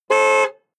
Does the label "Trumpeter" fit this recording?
No